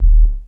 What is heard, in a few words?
MKS-7 Sub Vinyl Synths D#0, bass